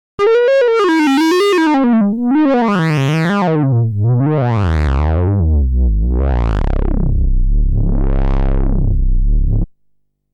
Loaded Wah

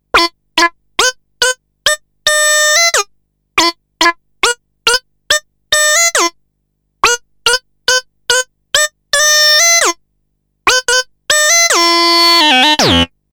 pitch up

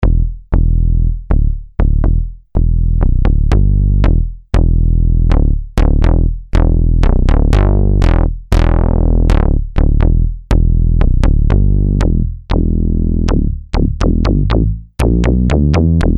grummel bass

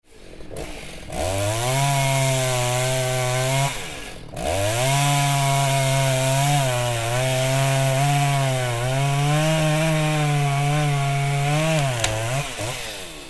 Autofocus

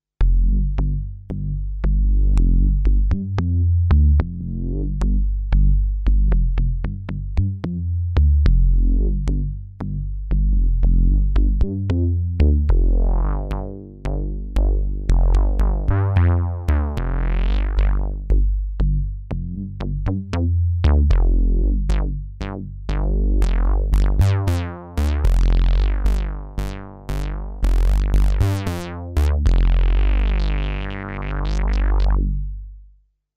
4 osc bass

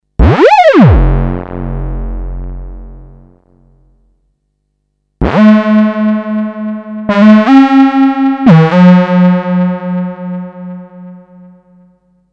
square

